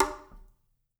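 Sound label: conga, left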